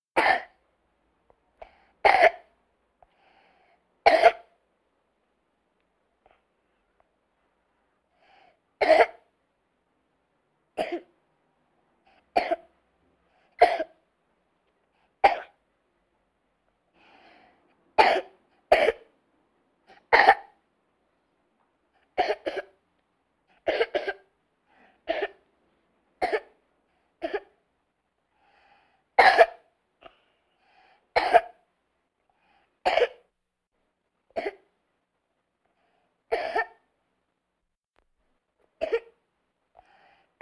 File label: Vocal effects breathing Moaning Baby